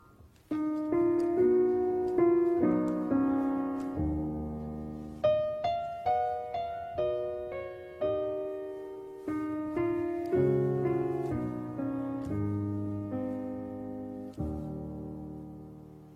Piano Duet

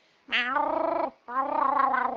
Constipated Cat

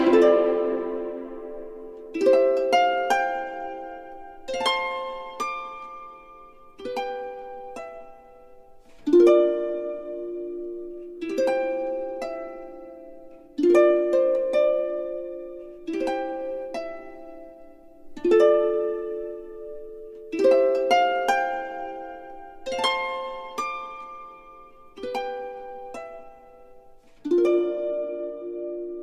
Harp

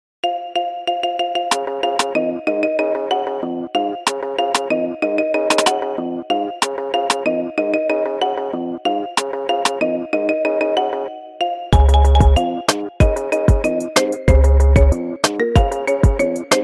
megatron marimba remix

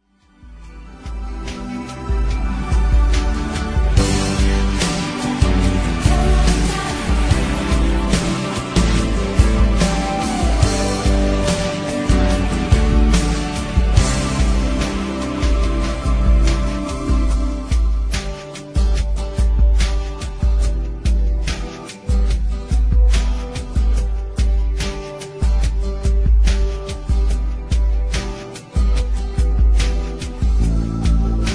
instrumental karaoke

